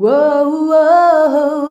UOUOUOH, FEMALES

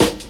SD, Dance Mega Drums; Best Service

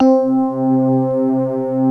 TINE STRINGS

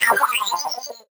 VocoBit Noise, 8-bit; musicradar; 8-bit bonanza

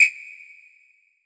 Whistle; MARKYDRUMS

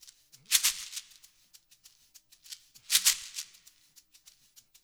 LOOP, SHAKERS, Percussion

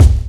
BD; Best Service; Dance Mega Drums

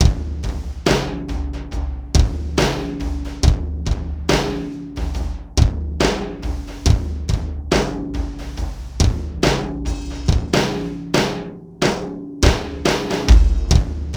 TRIPHOP; INIS; Funky Xtreams

